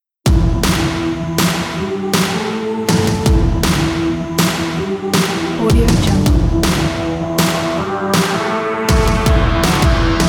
upbeat lets go sport rock

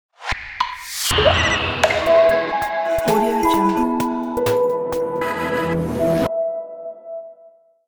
modern positive logo

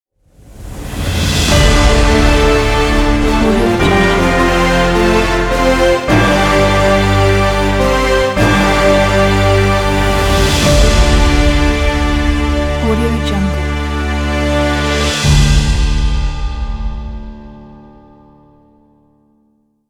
win fanfare logo

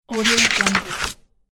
page turn

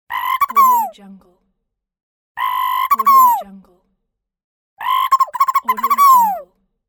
cartoon bird call

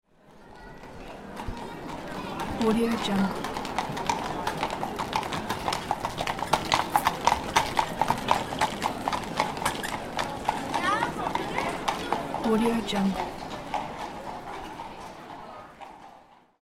horse walking

